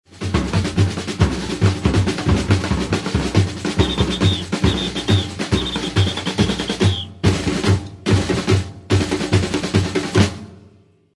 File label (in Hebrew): Whist Quick End, שריקה סיום מהיר; Batucada